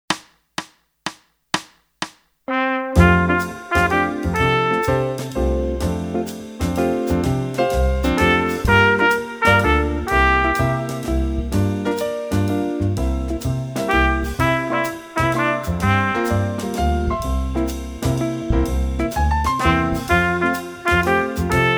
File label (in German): Echo Ubung